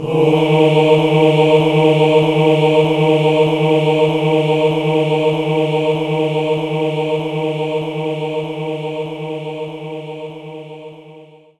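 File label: Concert Choir D sharp 3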